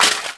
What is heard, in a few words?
Armour Hit; Civ4 warlords